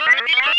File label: sphere blips lg; npc; Portal 2